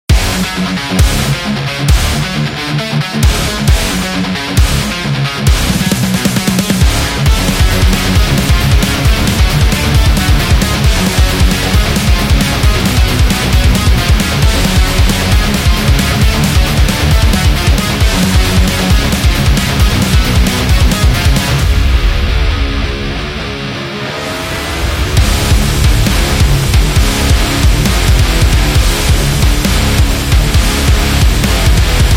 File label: Fast